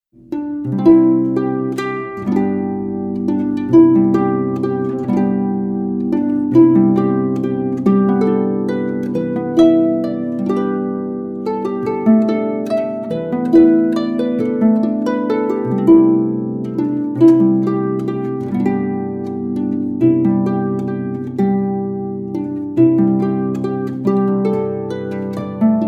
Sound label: irish love song; give me your hand